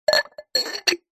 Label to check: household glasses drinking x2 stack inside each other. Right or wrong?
Right